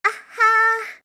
ahaa; awateru

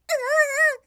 "uuuu"; hidan

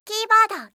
keyboard

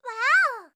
waao

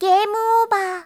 gameover

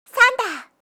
thunder; fantasy

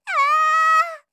aaaa; hidan

